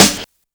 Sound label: DIRT SNARE; E-MU Formula 4000 Series Vol. 1; Hip Hop Nation; Hip Hop Kit 2